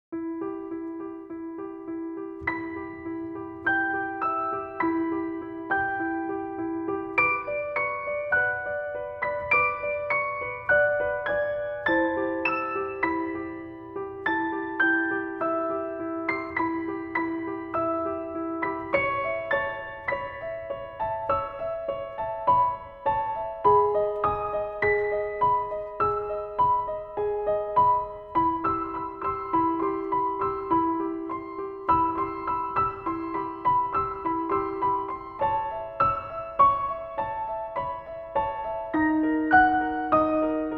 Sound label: piano douceur